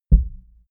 heart beat single hard; comedy-cartoon; koerpergeraeusche

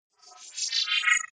wobble swell robot; sweeps-swells

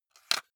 cassette case insert soft; objekte; kassette

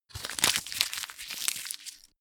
paper scrunch; industrie-wirtschaft; bueroarbeit

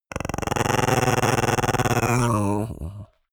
chihuahua growl creak

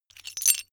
metal pieces clatter short

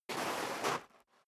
step sneaker gravel scuff

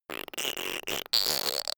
fake fart; koerpergeraeusche

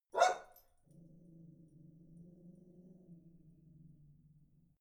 bark snarl angry, hunde; natur-tiere

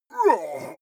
male fight moan; schreie; menschen; soundpool

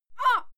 female fight scream short; schreie; menschen

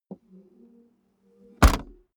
car bmw e46 exterior trunk close long

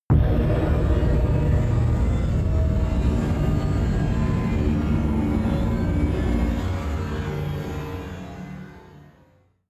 drone scatter; musikelemente

